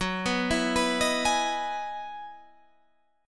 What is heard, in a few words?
E Major 9th F sharp; arpeggiated